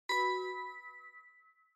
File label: OS Sound Notification